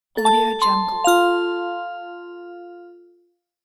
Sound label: small positive bells logo intro; macro-media